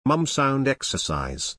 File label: mum sound exercise